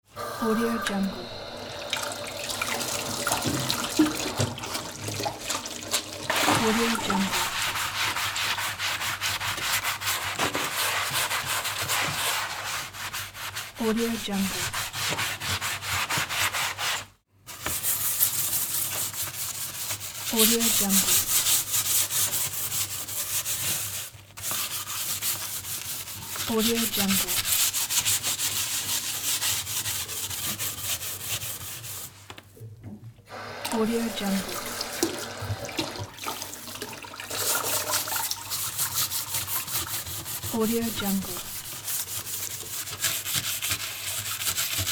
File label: water buckling and brushing